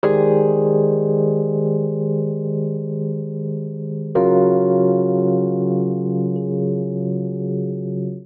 Progression Jazzy Soul Ab Major7#11 - Eb Minor11 Db 116 bpm, Electric Keyboards; Ableton; Factory Packs